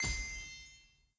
Reward; Conquest; blue archive

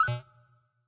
Error Neg Confirm; blue archive

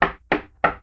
Knock; blue archive

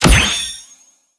UI Character Growth Limit Break New Star; blue archive

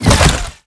Drone Missile Attack End; blue archive

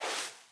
Sand Foot Step; blue archive

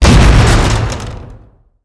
Drone Explosion; Decagram Ex; blue archive

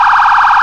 yelp, sirens; federal sig 650

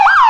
yelp, sirens; federal sig ss

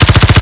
Drone Vulcan Attacking; blue archive

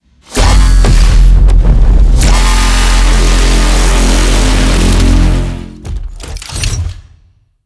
Laser; blue archive

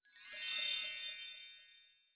Chime; blue archive

